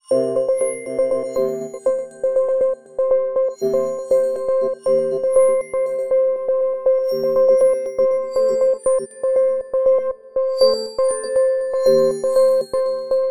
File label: alarms; Goldenrod; HTC One M9